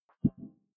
click big bubble damped, signale

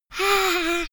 little girl dreamy; soundpool

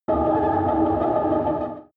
extrinsic fauna, energie

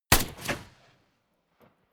rifle ak-47 exterior fire single bright; comedy-cartoon; Pistols and guns; pistolen-gewehre

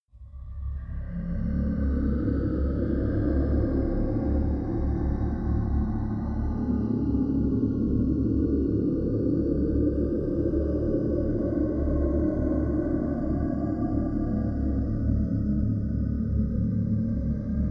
magic drone low evil

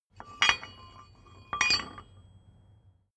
metal drawbar handling; industrie-wirtschaft

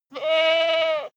goat baa high male; natur tiere; ziegen

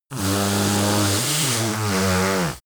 yeti nose blow long

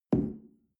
shell place on tablecloth soft; soundpool

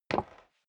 step sneaker wood creak single stair up

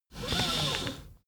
car seatbelt release fast

